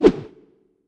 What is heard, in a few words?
Queen Attack swhoosh; we are the dwarves gamerip 2016